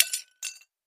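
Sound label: Tile Debris Hit Hammer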